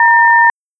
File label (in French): beep; ANDROÏD; taxi